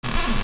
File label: SQUEAKS